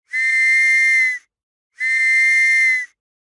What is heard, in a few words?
police whistle